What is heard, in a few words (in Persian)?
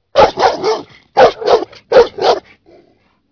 sedaye sag nar o made